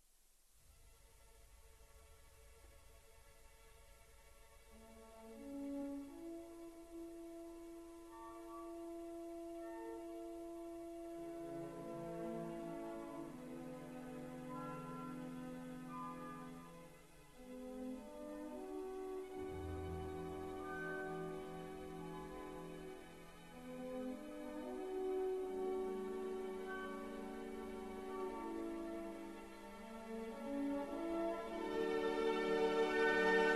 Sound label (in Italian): VALZER